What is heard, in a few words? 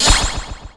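door open; lomax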